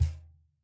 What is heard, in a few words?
Cajon hit p; Idiophones; Struck Idiophones